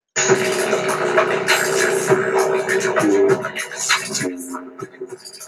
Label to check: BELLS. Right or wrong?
Wrong